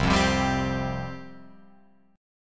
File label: C Suspended 4th C, Guitar Open F; Csus4 strummed; 0,0,0,2,3,2; JGuitar